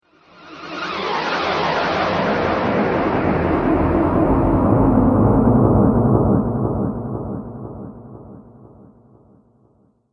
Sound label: Digital Brainwaves Flashback Whoosh; Twistedtracks